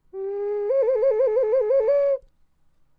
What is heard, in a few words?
ocarina; chords